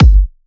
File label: Kick, 128BPM; Praetor Kick 2